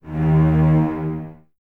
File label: Cellos, String Ensembles; D#2; Miroslav Vitous; LINKS ORCH